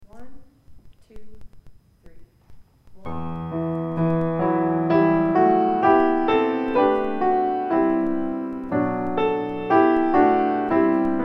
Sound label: Piano and Bells Only